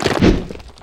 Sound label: preattack; tiny